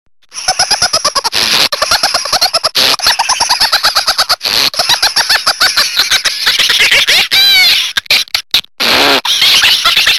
egg song

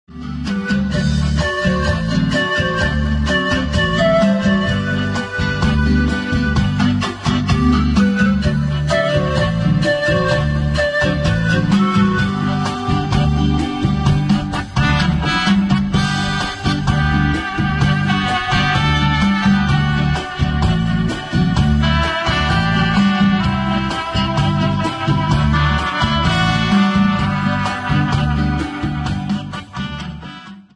instrumental

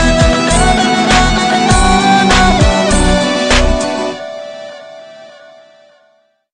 Musical Flow

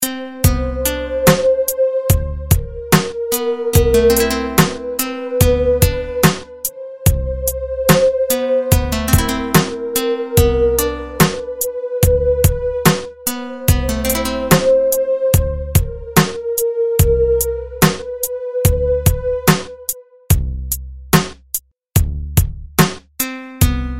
quiet guitar; alternative

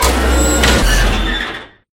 Pickup

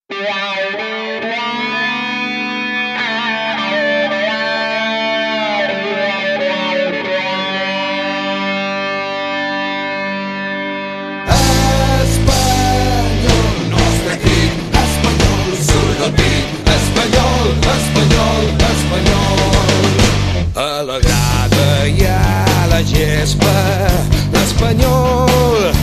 Himne; RCDE

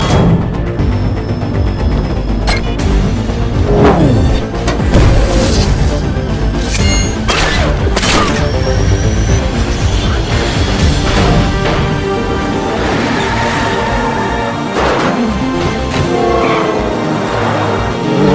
motorazr, ringtones electronic

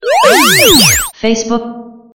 facebook tone; ringtones; sms

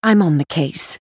Ska

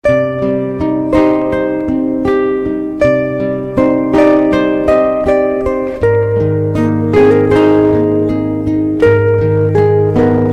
light guitar

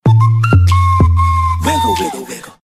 Notification; Wigle

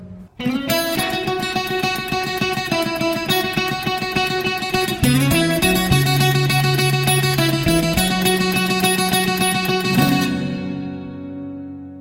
future ring

